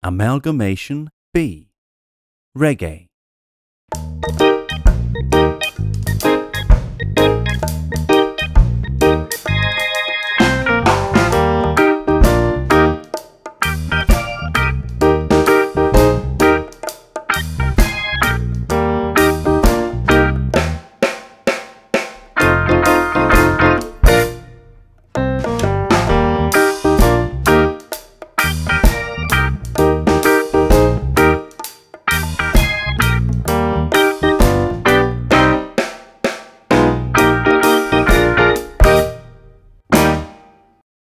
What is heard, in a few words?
Reggae Amalgamation, exam music